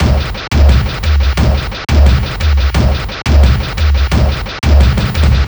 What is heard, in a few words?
DnB